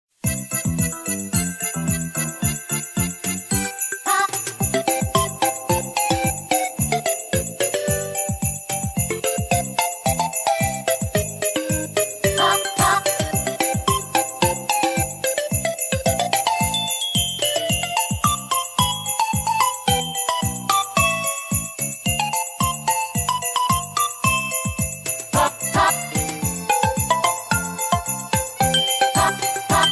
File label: Snow Theme; NSMBU